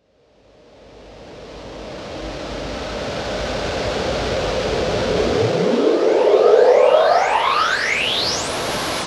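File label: Reverse Filter Sweep, Cinematic Metal Impacts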